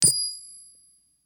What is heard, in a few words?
snips, notify; NovaRealm